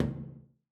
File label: pipe step